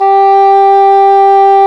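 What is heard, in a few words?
English horn; Sound & Vision - Gigapack I CD 2 (Roland); Brass&Woods1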